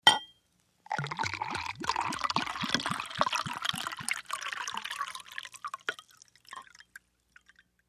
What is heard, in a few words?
Beer Bottle Pour Glas